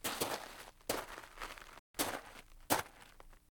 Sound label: Gravel Foley